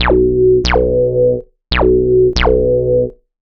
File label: ELECTRO BASS 140 A